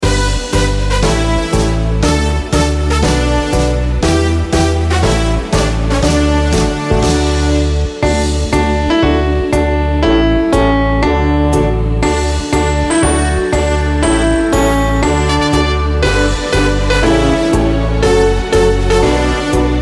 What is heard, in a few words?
karaoke